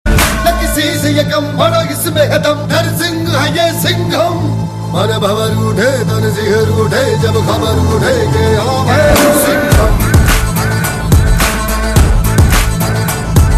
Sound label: new bass